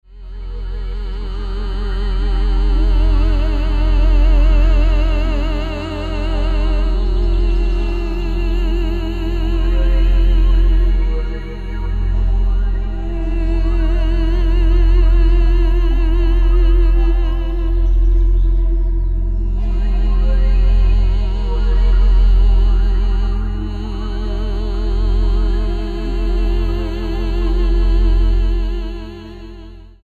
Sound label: Hum Wood